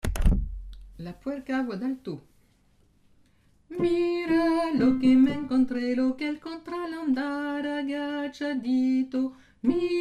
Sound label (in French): la puerca alto